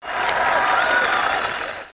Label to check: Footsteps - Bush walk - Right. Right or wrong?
Wrong